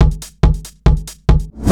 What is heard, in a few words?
DRUMLOOP TEKNO 140; Techno2